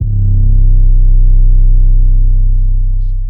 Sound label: Droid 808